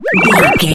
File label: retro magic spell cast